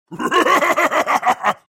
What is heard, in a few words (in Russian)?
zvuki orkov